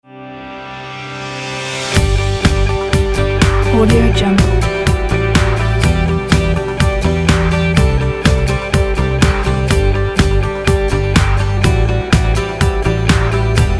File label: upbeat corporate motivational